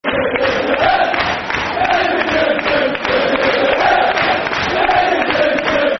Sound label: De De De De De David Nugent [Football Chant (Soccer Songs)]; Preston North End Pne